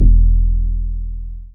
BAS FM DX-7

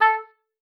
OBOE2, A4; Xsample VOL-6

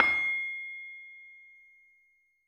LAMEL C6 -R; USB Soundscan vol.60; AKAI; LAMELOFO

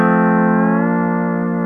PEDAL STEEL

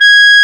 CLARINET G#5, WIND; AKAI S-Series CD-ROM Sound Library VOL-2

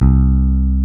C 3, E-Basses, Roland

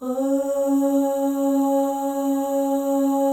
FEM OHS, Symphony of Voices; Spectrasonics

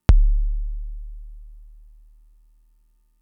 Kick, Drum Machines Fricke MFB-522

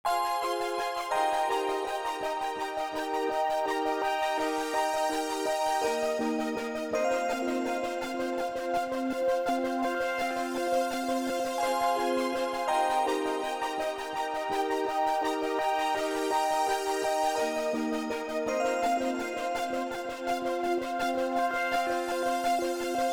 synth, Smooth RnB, Fmin

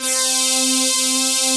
P CUTTERS, synths; Pro-Rec - Synthsonic

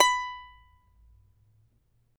CHARANGO; Spectrasonics; Hans Zimmer Guitars VOL-2

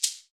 Ahfuche; Club-50 - Foundations Roland; Ahfuches

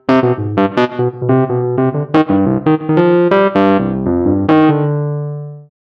Rhodes Solo, Cinematic Funk; Sony Creative Software; Urban Tone Poems; Some Water

